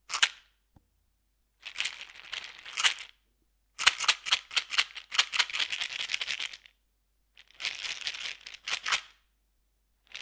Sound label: Pill bottle